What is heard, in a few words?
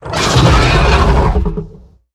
Onyxian Drake Attack